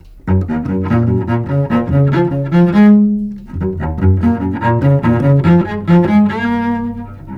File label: Cello, Fathead; Listening Test-4: Cello